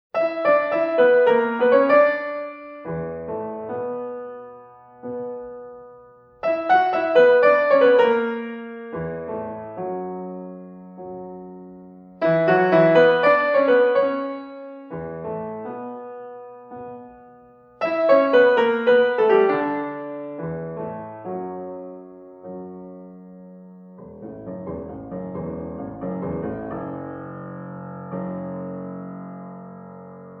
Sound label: solo piano music, Unison; Юдина; Наследие Марии Юдиной; Bartok; Microcosm